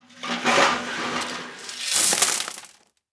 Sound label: farmhouse; Immortal